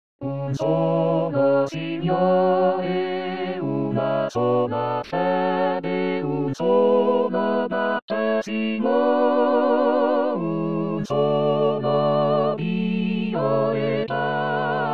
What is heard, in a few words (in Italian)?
Un Solo Signore Coro